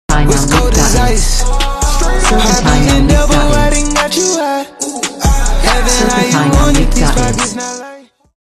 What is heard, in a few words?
heartbeat